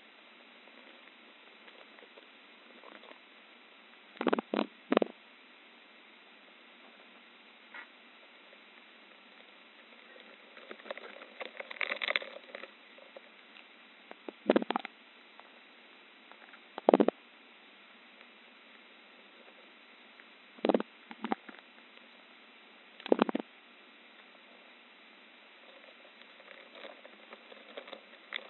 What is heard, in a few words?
roach moves